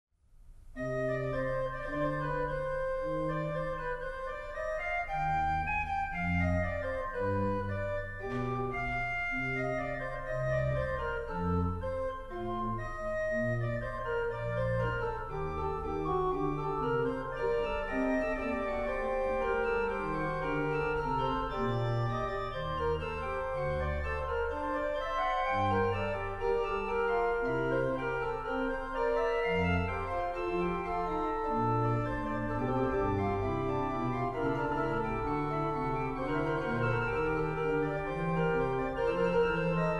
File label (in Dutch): Triosonate in d, orgel; Bach; Triosonate nr 3 in d; couperin-orgel